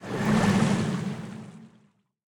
glide; smite; poseidon

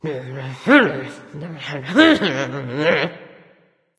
grumble; smite; skadi

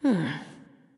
NV Thinking; smite; awilix

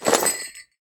armor heavy; smite; chiron

